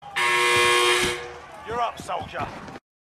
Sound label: buzzer